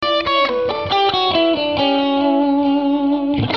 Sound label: Em 135 country lead